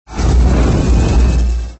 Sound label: Open; FreddyVSJason